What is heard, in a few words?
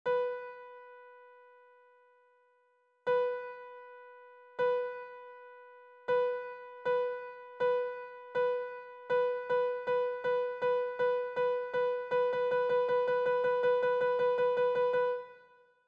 rythm